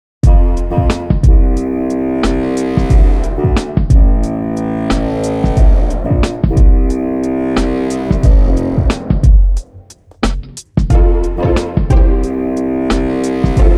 Hip Hop

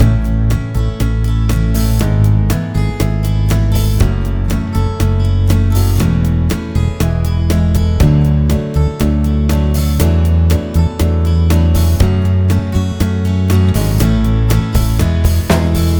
Verse Loop

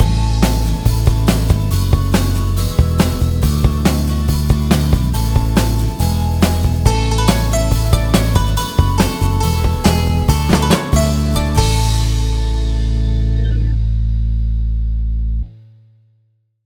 Chorus and Outro

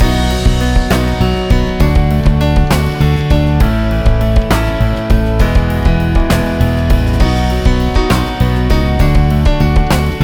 Chorus Outro

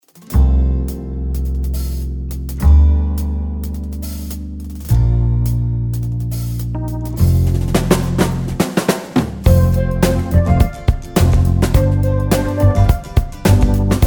Shuffle

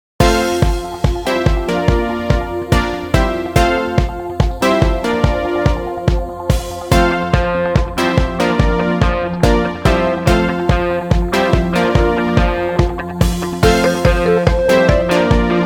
Joyful Noises

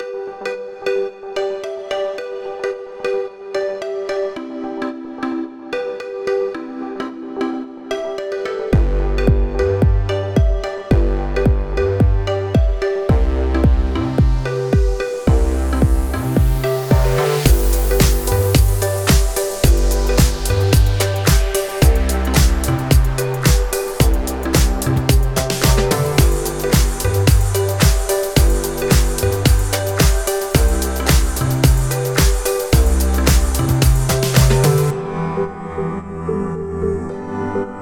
110bpm

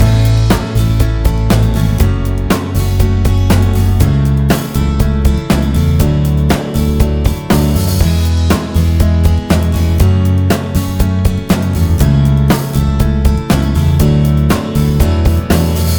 Chorus Loop

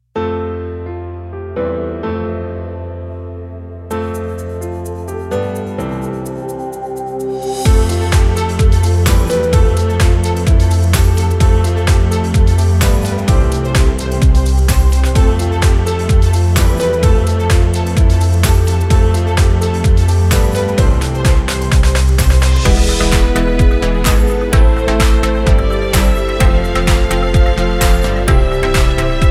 Upbeat Stroll Short

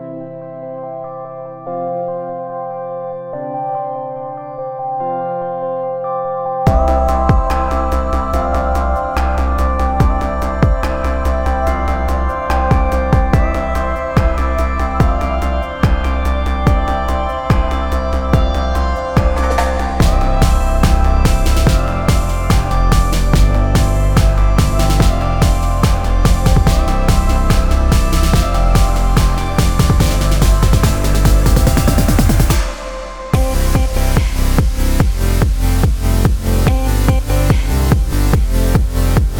144bpm

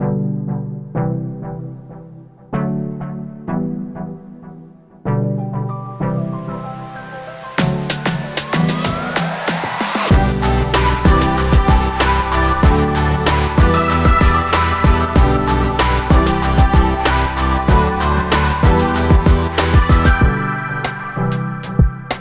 95bpm

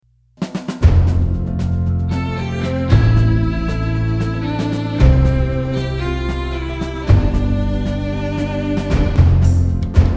Old Barrel Jig

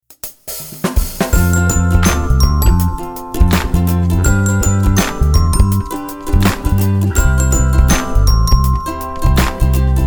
Ukulele

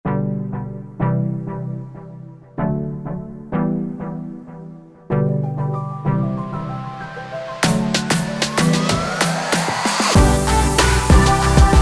95bpm

